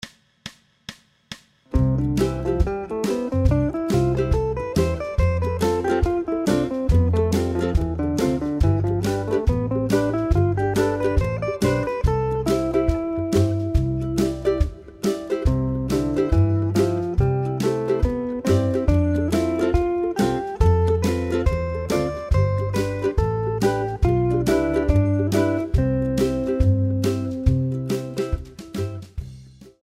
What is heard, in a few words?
c scale fast slow, guitar